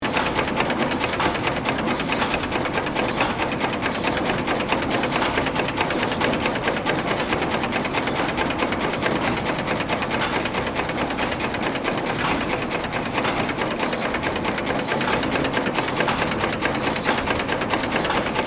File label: Industrial ambience warehouse machinery background loop